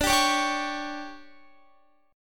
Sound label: D Major 7th Suspended 4th, Guitar